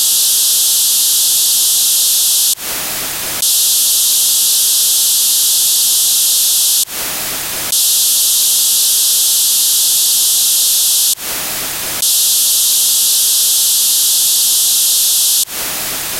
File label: 9600bps